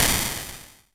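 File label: electronic noise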